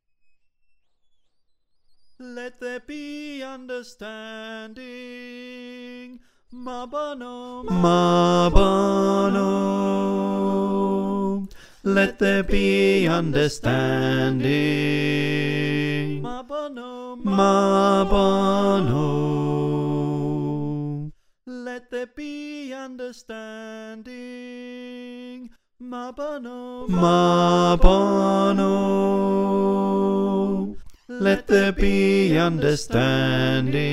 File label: bass, choir